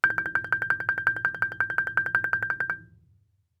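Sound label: Marimba roll fortissimo G6; MIS Pitches - 2014; Percussion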